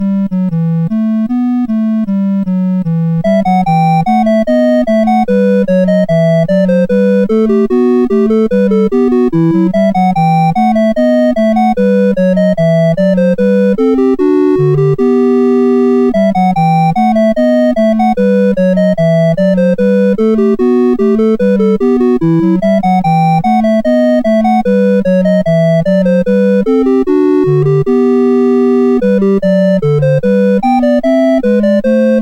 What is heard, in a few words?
Bourree, sid CSG8580